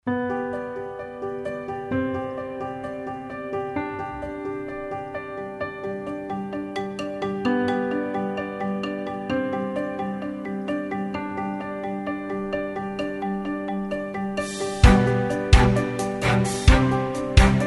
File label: corporate